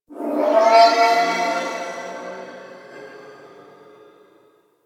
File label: Gas Dist Energy One Off; Ambience; Warframe; Corpus Gas City